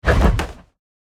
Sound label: Fight combat game menu ui clear